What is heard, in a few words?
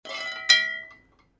Dragging; game inn